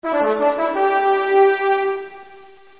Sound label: levelup